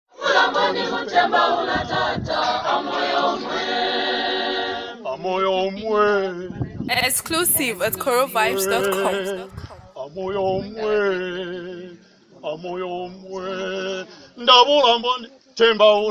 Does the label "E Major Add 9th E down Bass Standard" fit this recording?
No